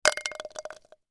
Glass Container, Foley Glass Container Object Drop In; Dishware; Household; Blastwave